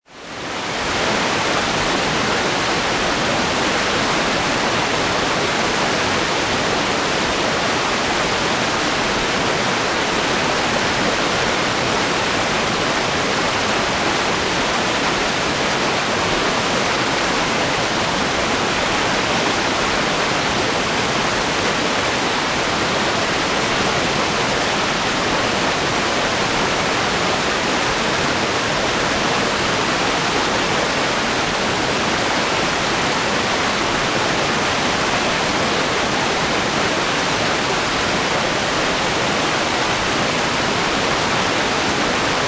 Water Rapids Flow River, Evenly flowing water rapids, river or heavy stream white noise.; Can also be a waterfall.; River Sound Effects